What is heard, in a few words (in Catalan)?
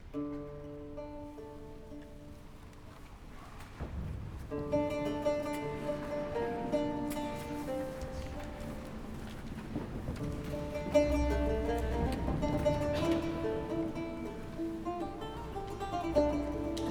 Improvisació llaüt